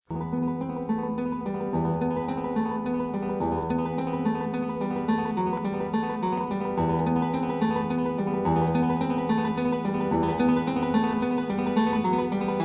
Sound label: Guitar Solo